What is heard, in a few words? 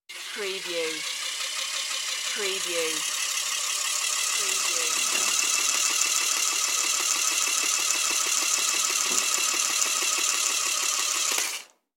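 Spinning Toy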